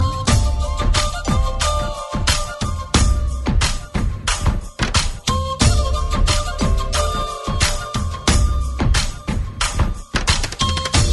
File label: Flute Electronica